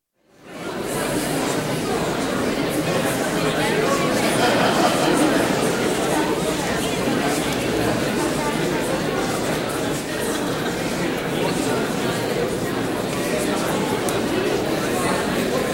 Large expectant crowd in hall, general chatter (Live acoustic recording); Crowd Sound Effects; BBC